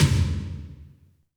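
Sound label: tom drum, left; Best Service; Real Mega Drums VOL-1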